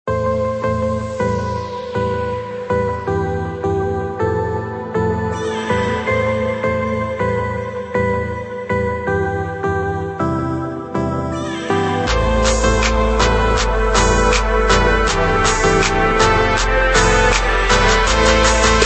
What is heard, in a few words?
Trap; Prime Loops Total Samples Ultimate Trap Massive Presets